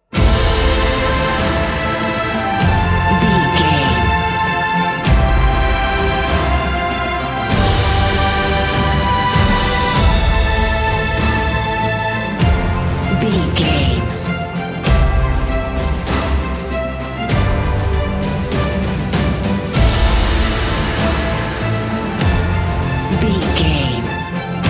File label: cinematic corporate